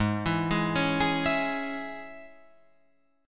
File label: G sharp Suspended 4th Sharp 5th G sharp, Guitar Open F; arpeggiated